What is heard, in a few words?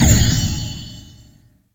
crash orbs; gd; thirtydollarwebsite